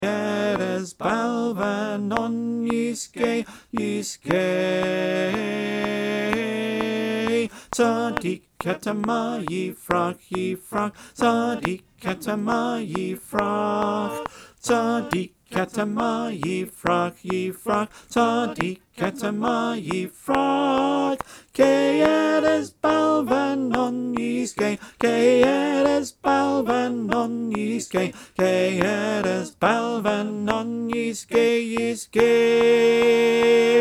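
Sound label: Tenor click track, choir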